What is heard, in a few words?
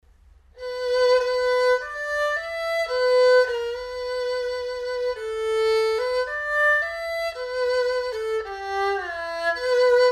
erhu